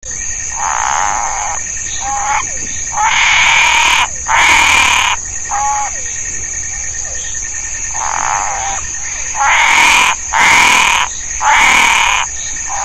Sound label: Hypsiboas Multifasciatus